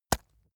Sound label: leather impact mud hard